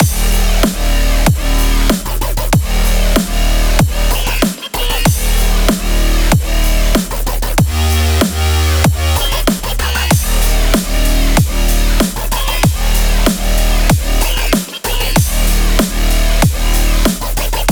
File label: Electronic; Electronic Vol4 Music Pack